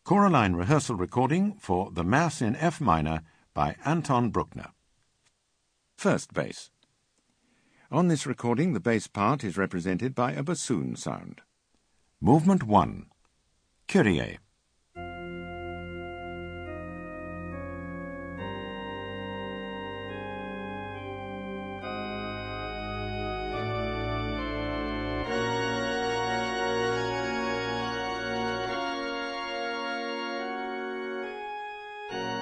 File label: Bass; Bruckner Mass in F